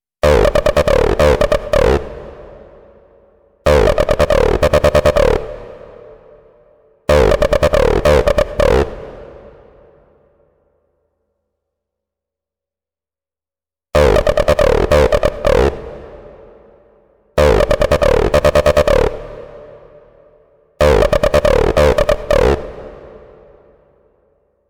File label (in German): 101 stark verzerrt